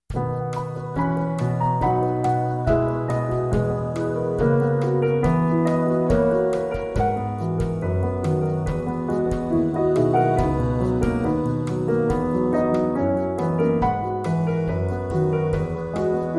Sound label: With Trio Synth Pad; 30sec